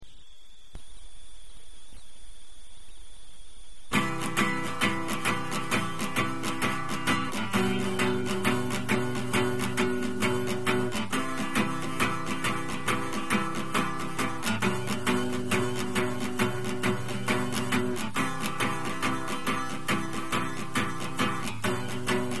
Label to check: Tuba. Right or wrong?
Right